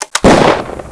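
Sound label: Rifle Sound; Close Combat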